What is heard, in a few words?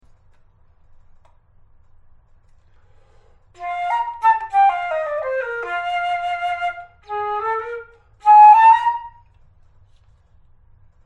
flute